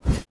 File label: hipcutter foley walk